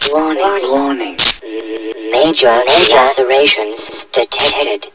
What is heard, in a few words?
zombie idle